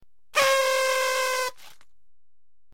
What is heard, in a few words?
party, whistles; magicworld